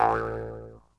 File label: skull bounce; theGoonies; Retro